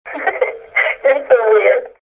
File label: phone short